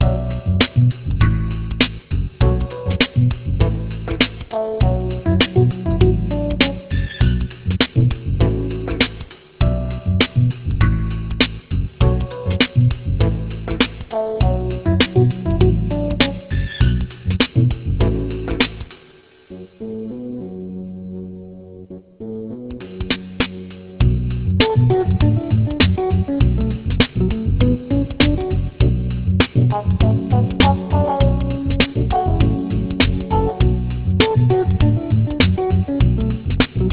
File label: lydien; jazz; groove